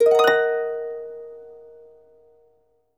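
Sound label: harp; Best Service; Peter Siedlaczek's Advanced Orchestra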